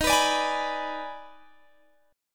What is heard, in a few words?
D sharp Major 7th Suspended 4th Sharp 5th; D#M7sus4#5; strummed; x,18,21,19,21,19; chord shape